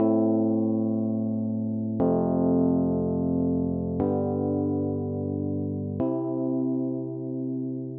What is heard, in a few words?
Chord